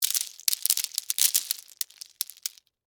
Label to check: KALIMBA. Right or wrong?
Wrong